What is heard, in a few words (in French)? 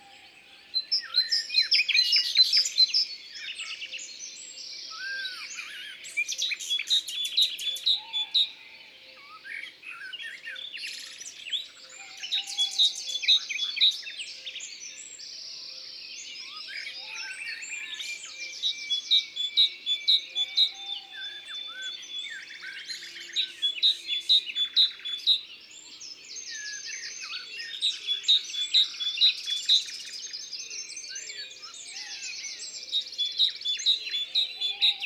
Mesange charbonniere; Isere; Concerts naturels de l'Isere; Studio Les Trois Becs